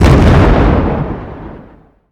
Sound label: Explosions Medium Explosion